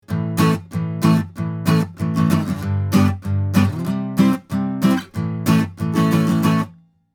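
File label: Austrian Audio OC818 Acoustic Guitar harder 40cm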